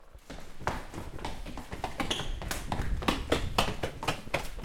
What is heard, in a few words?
Multiple People Running